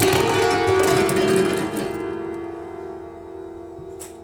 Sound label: Prepared Piano; Battery 3 Sample Kits